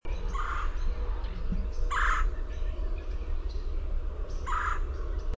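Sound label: kwirr, red-bellied woodpecker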